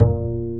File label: BASS A#3; ORCH.COMP